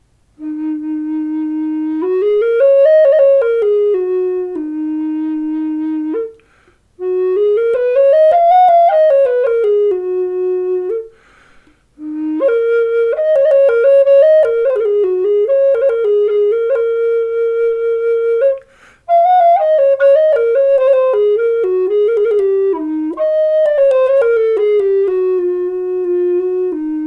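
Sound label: Eb4 Spruce, Native Style Flute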